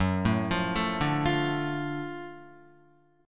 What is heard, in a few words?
Gb Minor Major 7th Sharp 5th Gb down Guitar; arpeggiated; JGuitar